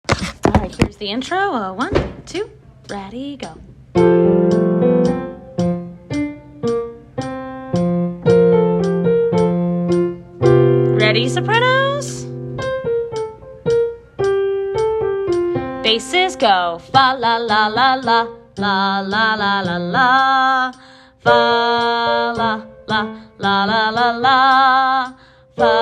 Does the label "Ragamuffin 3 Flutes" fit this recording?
No